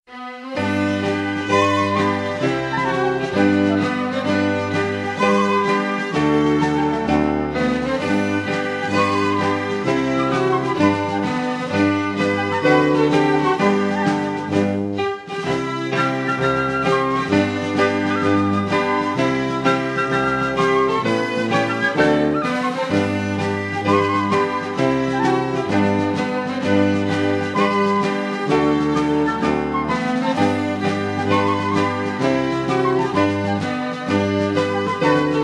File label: proudly marching; ВидеоМОНТАЖ 23.0; ams music library